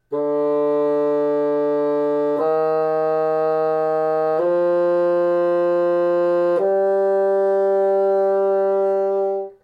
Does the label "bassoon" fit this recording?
Yes